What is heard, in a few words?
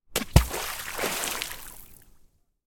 splash; petz